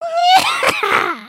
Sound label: dance; emotes